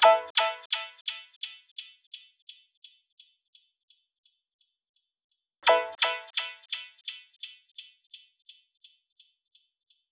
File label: Chord Echo; Logic Fun